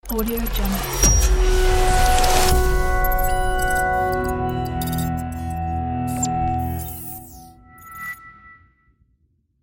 technology ai intro

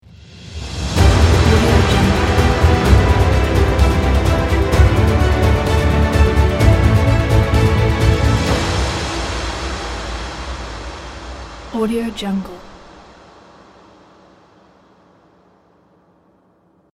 the epic logo

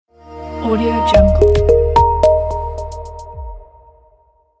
ambient logo reveal

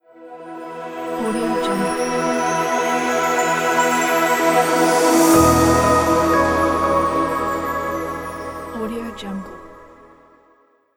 aetheric logo reveal

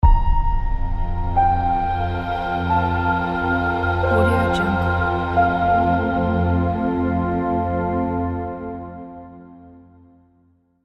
arctic ice logo

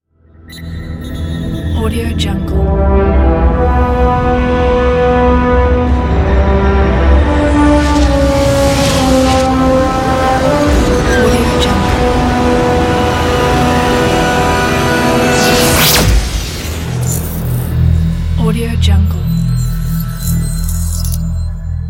space engine logo

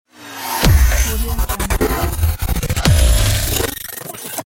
cinematic stuttering rise

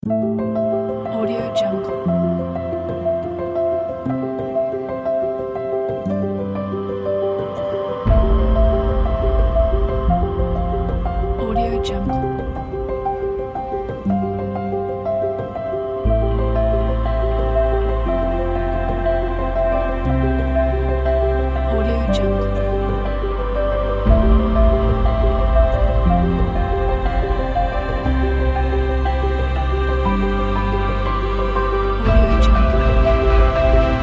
elegant cinematic

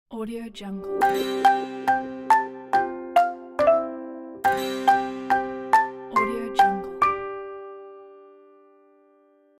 childrens ident

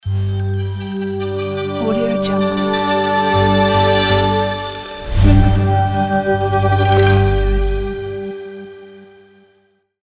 tech vibration logo

